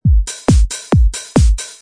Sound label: simple beats